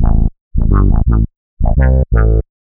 Bassline 85 A, hiphop, 85bpm, Bass; musicradar

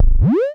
Amdek Perc

